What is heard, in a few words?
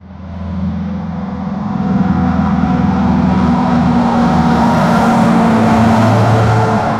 plane engine on takeoff; aviation